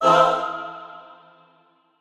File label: chor